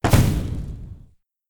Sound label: Explosion